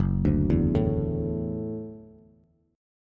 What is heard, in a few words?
Gb Minor 7th Sharp 5th Gb, Bass; arpeggiated; 2,5,2,2; JGuitar